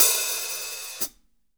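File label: hat open close, drum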